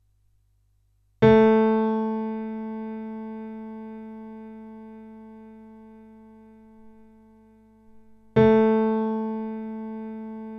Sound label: PIA 440, piano; meting piano